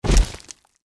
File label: deathclaw foot; npc